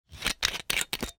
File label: BOTTLE GLASS